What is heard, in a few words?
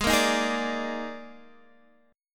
D sharp Major 7th Suspended 4th G sharp, chord